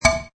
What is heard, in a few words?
Metal Tin ding; Metallic Sound Sound Effects